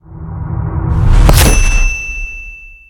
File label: warp